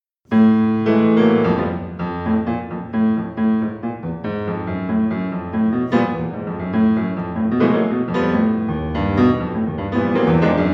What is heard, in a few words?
etudes